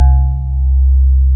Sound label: PIA DX E-PIANOS